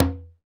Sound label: PRC CONGA, Roland; Northstar - Drumscapes Roland; Bonus Perc; Congas